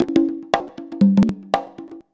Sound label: CONGA 119, Modern Percussion Loops